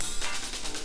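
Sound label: Fill Snare 142 D, Lounge Lo Fi Break Fillz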